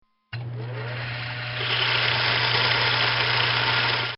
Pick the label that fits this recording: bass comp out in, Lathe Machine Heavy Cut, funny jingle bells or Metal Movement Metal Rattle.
Lathe Machine Heavy Cut